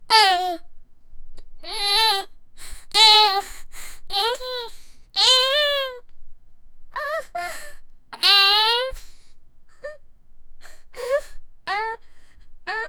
the girl is actively invo

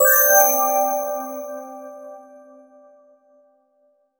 one ui confirm click plea; artificial

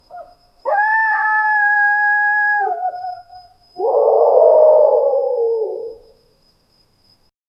distant animal calls; forest

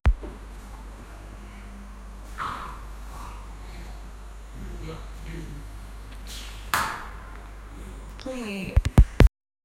m4a1, gun

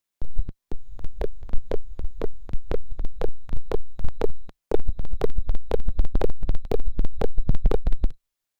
energetic modern beat

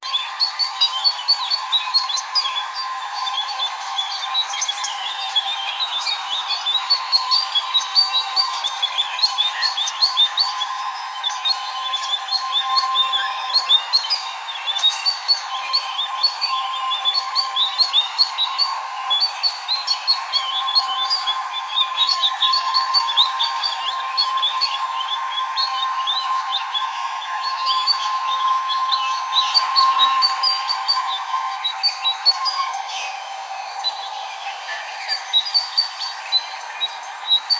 an instrumental sound for, birds

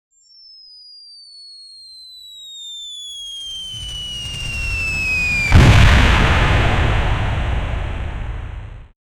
bit sound of a firebal

